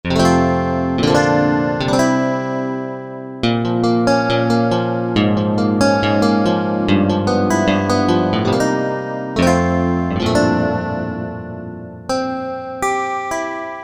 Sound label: Clean Strat, Korg MSC-6S Fretted Insts; Fretted Insts 1